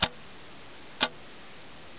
tick tock; zm rockhouse

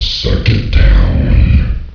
adrenaline

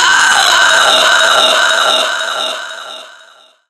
Siren Infected; zombie plague

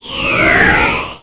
teleport; cstrike; War3

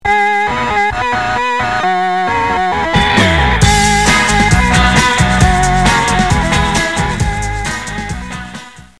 surf

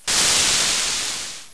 grenade infect, zombie plague

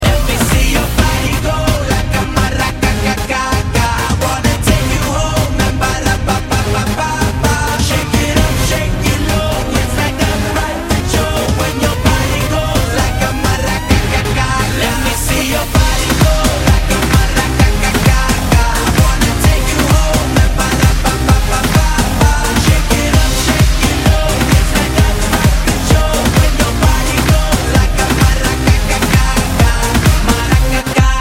maraca

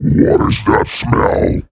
zombi coming; cstrike

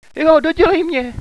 hostage pain; cstrike

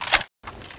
t68 bolt pull, weapons

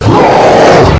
nemesis pain; cstrike

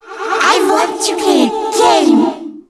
dark zm idle, zombie; zombie plague

